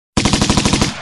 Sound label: Gun Alert